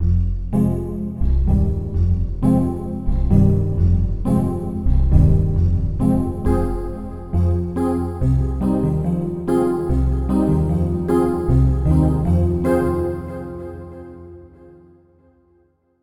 Ooo piano; KORG OASYS; oasys assault

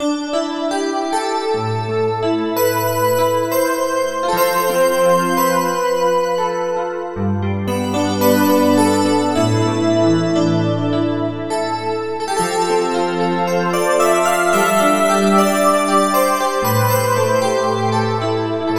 Crystal Sparkle; KORG OASYS; oasys assault

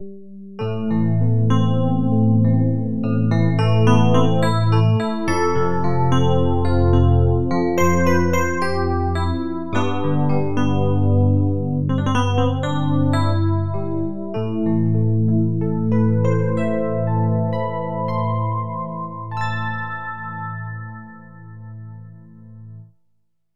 FM E-Piano; KORG OASYS; oasys assault

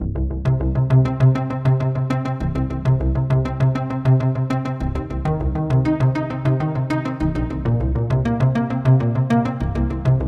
Celtic Synth; Celtic Synth 3; KORG OASYS

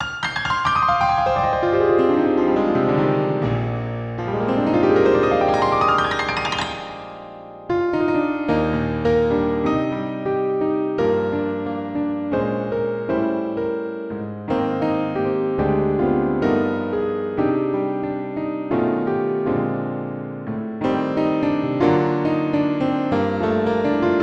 long grand piano; tyros concert grand

